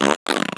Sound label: fart